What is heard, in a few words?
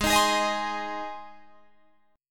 G sharp Major G sharp Guitar Drop D, chord